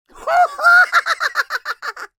cartoon high pitched laughter hysterical funny male